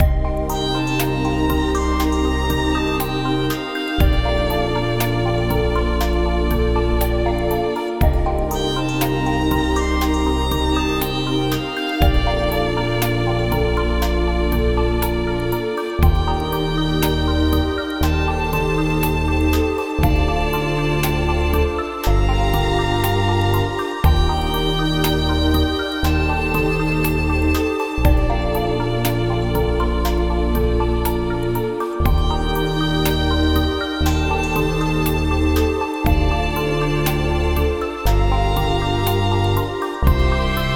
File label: Fishbowl Romance, fantasy